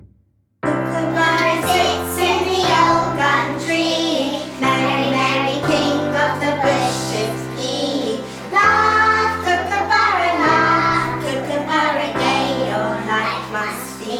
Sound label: Kookaburra